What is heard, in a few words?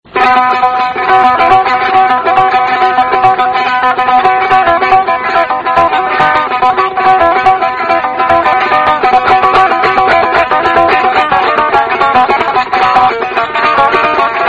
melody; avar